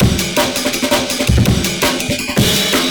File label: 165 Jungle Break, Loops; m8 breaks